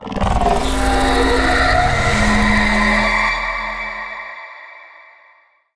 Vocal Portal Enter; Warframe; Zariman; Void Angel

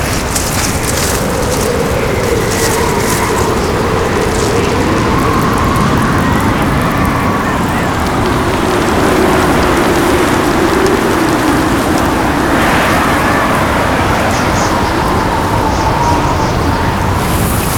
Settlement Sandstorm Loop; Warframe; Ambience; Grineer Settlement

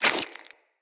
Root Spider Foley Leg Swipe; Animals

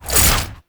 Whoosh, weapons; Gunsen Prime

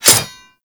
Dagger Metal Impact; Warframe; Dual Daggers; Hit Effects

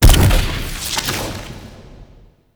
Noctua Alt Shot Punch

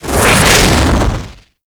Tornado Cast; Warframe; Tengu

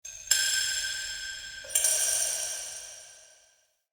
Crystal Coin; Ambience; Soul Plane; Vendor

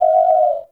Owl Idle Chirp; Warframe; Duviri; Glyph Puzzle

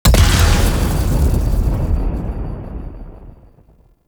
Obelisk Activate

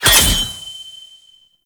Boss Projectile Reflect; Warframe; Cephalon Weave

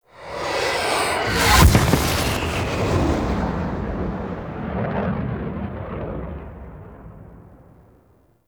Fly By Slow, Vehicles; Warframe; Liset; Zariman

